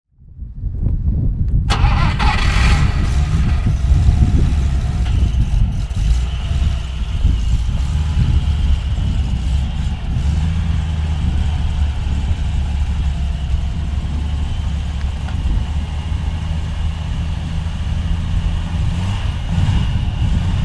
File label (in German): BMW 501 Leerlauf; 501 Baur